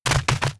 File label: Cyber Horse run; Seer; GamigoNA